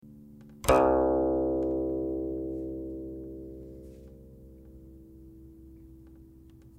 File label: guqin; chuYinKouXian